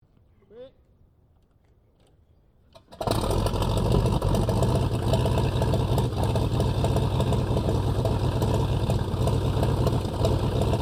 AIRCRAFT 1916 SOPWITH PUP REPLICA STARTUP IDLE STEREO; Replica of a 1916 Sopwith Pup; Airplanes (Sound Effects:Vehicles)